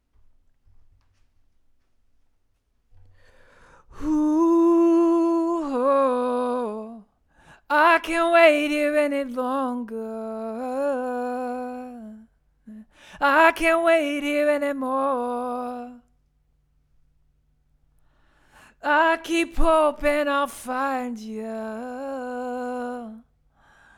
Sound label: Vocal